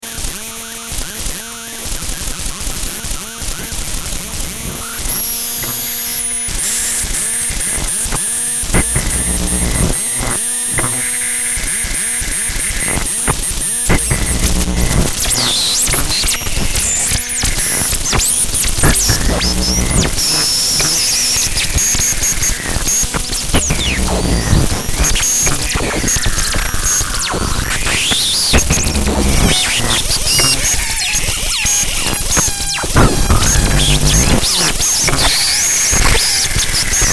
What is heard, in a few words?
stutter slurp